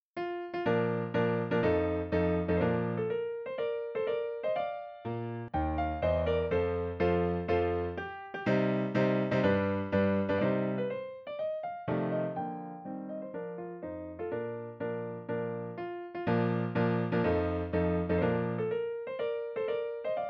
Marcia Per Pianoforte; Ludwig van Beethoven

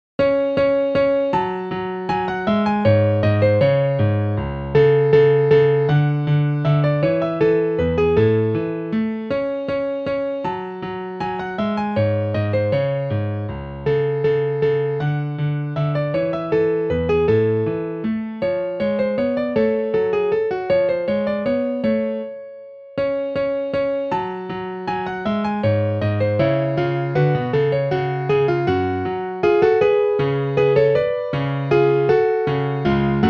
Menuetto Con Abbozzo Di Trio Per Pianoforte; Kafka; Ludwig van Beethoven

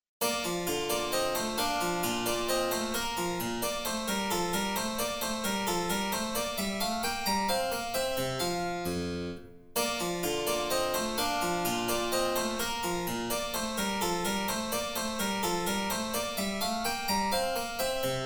Menuetto; Kafka; Ludwig van Beethoven

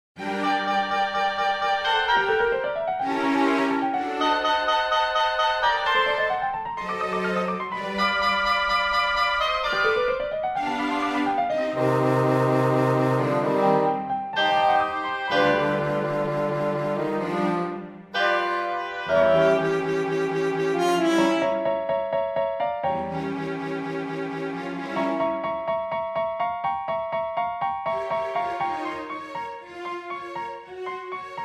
Concerto Si Bemolle Maggiore; fragment - prévu comme mouvement lent d'une œuvre plus grande

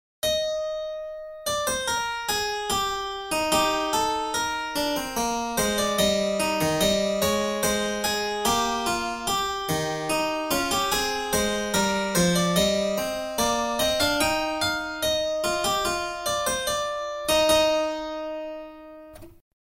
Fuga Alla Ottava; Harmonie Beethoven

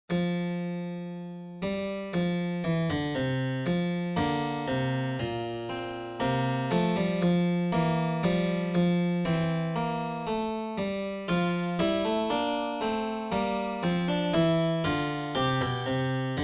Fuga Alla Quarta; Harmonie Beethoven

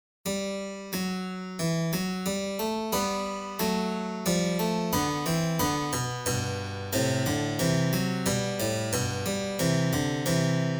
Fuga Alla Terza; Harmonie Beethoven

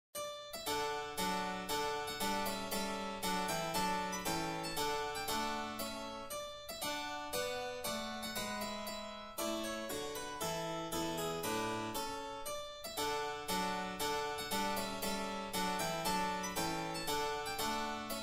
Menuetto Per Cembalo; Kafka; Ludwig van Beethoven

